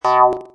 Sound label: baboing